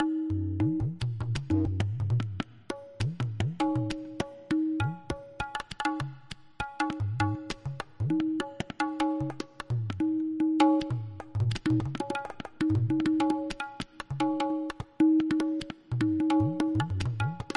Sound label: tabla